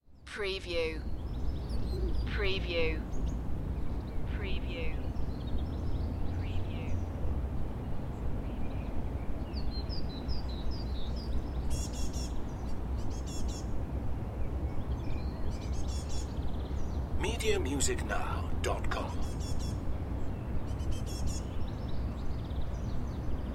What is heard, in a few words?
City Park Light Ambience